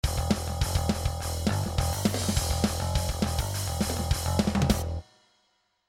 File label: pick electric bass; sibelius rock and pop